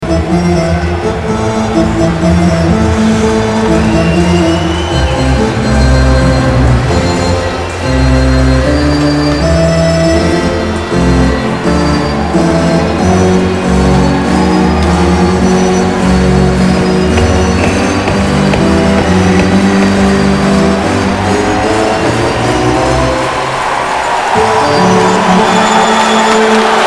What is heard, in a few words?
ORGAN; OLYMPIC